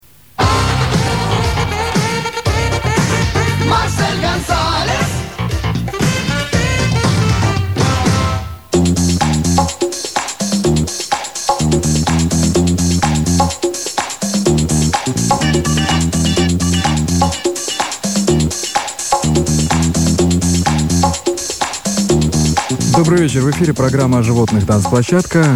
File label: music